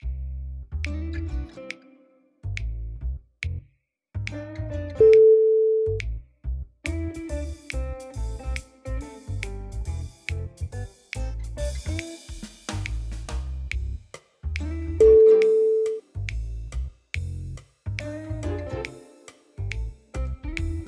sneaky jazz

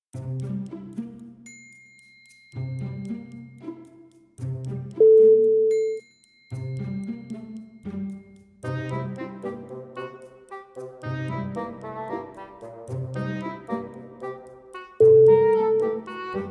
cute robot theme

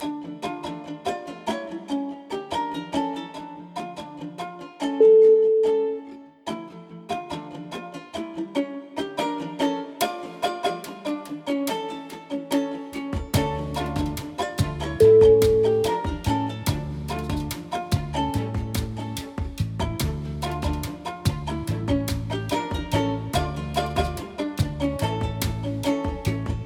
spanish love

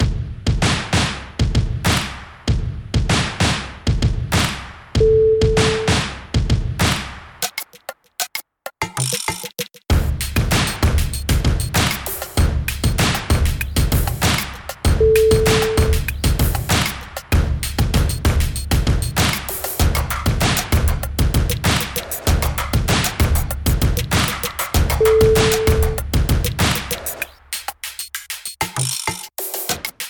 clap groovebox

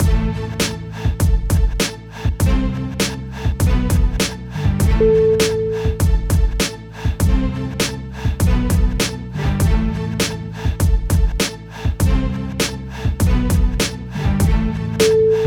hip hop battle

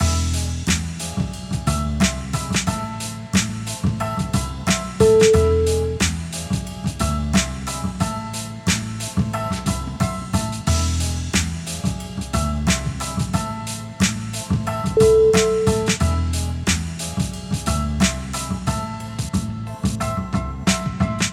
spanish vibe

